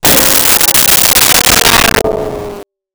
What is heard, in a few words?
Comical Metal Gong